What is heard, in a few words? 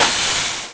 steam ATTACK; The Elder Scrolls III Morrowind GOTY; XBOX